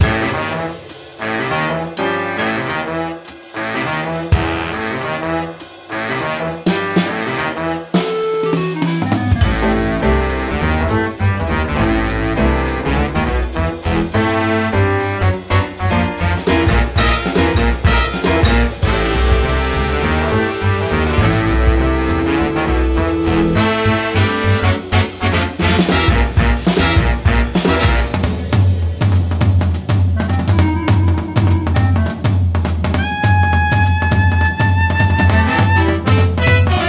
Jazz chase loop; Christmas Shopper Simulator 2 Black Friday

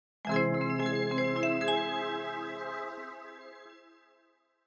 MIDI Mystery

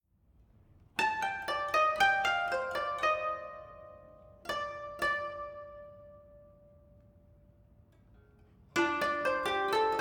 Savannah Music

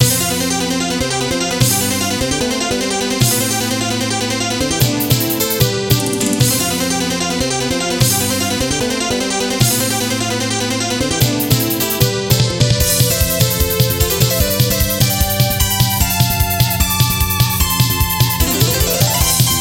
Close Quarters - Stage BGM; msx; Gofer no Yabou Episode II

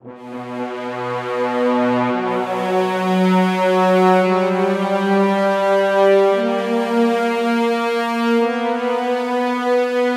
Cave Mid Hi Cor; Torchlight III; PS4

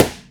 balloon pop

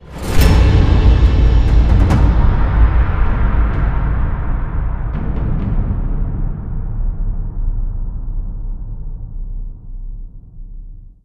Combat Intro

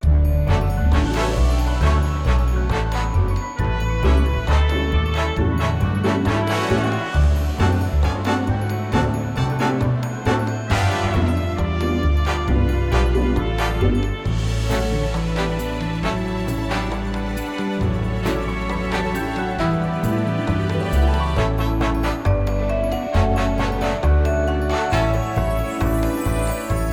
Map music; Cooking Dash DinerTown Studios

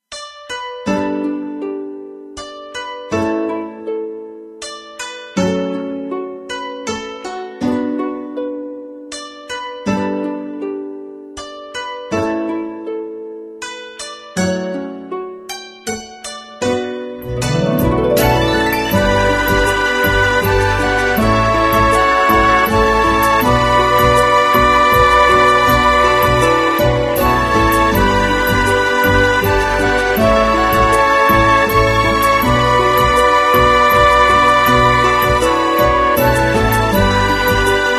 ending true; Yotsume God Reunion; Android

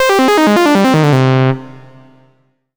Solo Clean Sawtooth Negative; MUSIC EFFECT; startide

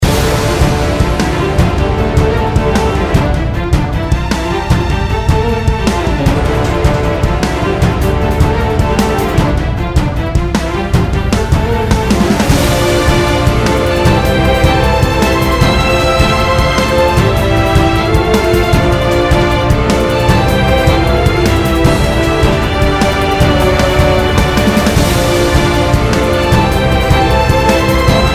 Raid Boss; Mist Train Girls

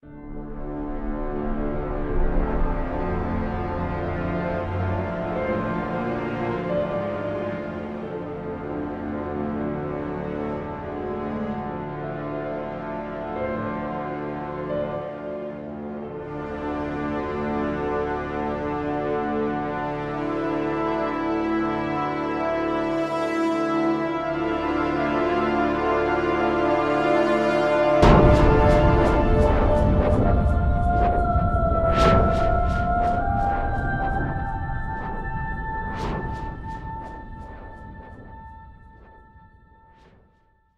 Sadness Ditty; Cayne

